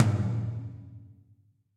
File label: Tom Low Dark Plate Verb; Drum Hits; Ableton; Factory Packs; Session Drums Club; MPE Hits